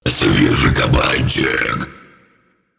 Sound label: knife